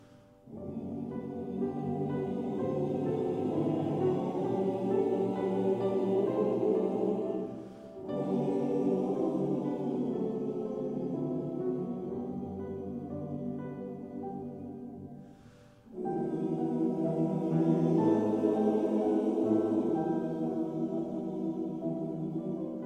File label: Hine e Hine, choir